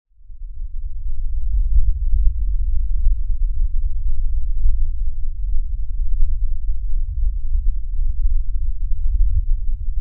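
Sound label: low hum of a boat; Звуки подводной лодки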